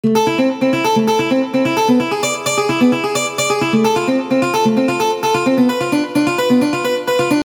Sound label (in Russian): гитара